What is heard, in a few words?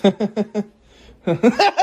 laugh; tabarnak